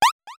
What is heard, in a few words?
tiny Hop; superPlatformLand